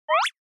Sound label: Motion Pop